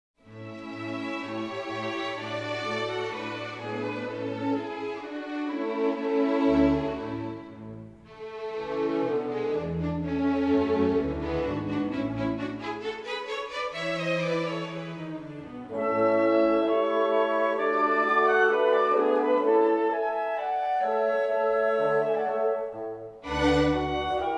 Piano Concerto No. 23 in A Major, K. 488 III. Allegro assai; Mozart, Wolfgang Amadeus